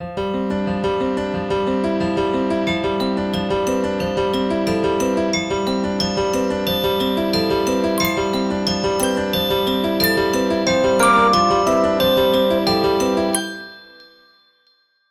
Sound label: gameplay interstitial promo